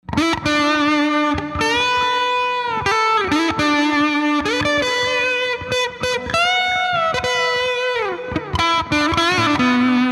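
Sunface n Colorsound, Sunface with gain at 50% and Colorsound Overdriver on light boost setting, then cranking the gain on the Sunface wide open. MXR Carbon Copy delay.; Sunface Sound Clip 5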